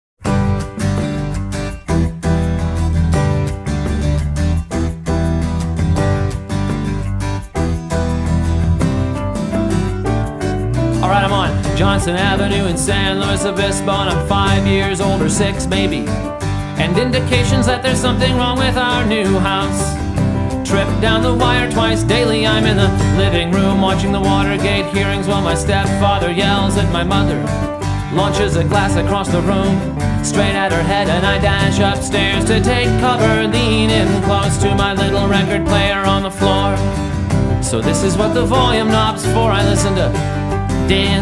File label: Dance Music